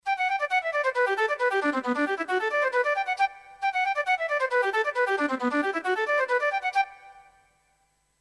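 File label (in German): solo flöte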